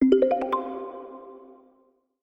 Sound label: Mallet, notifications; Canonical; Ubuntu-Touch-16.04-OTA-5